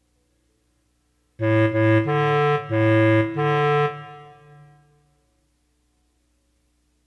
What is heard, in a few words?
melody recognition